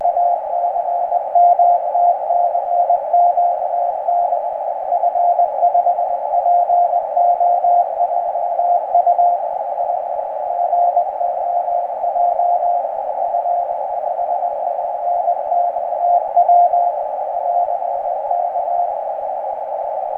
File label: FT857D 21011kHz; F5POJ